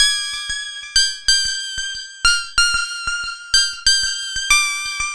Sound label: Lead, Synth, Leads; Sonic Foundry (Sony Creative Software); Electrocution